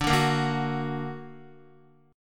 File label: D Augmented D down Guitar; D+ strummed; JGuitar